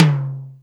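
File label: Hi Clear, Alesis D4 drum machine tom; 300 Drum Machines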